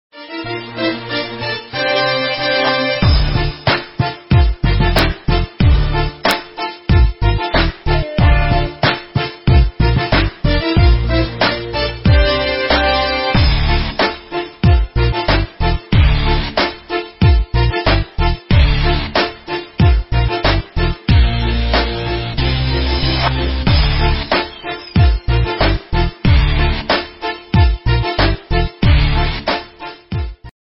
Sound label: bgm